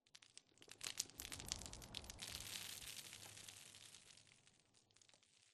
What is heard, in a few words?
Rock Debris Small Short; Boomtown; Titanfall 2